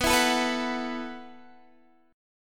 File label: B 7th Suspended 4th B down Guitar Standard; B7sus4 strummed; JGuitar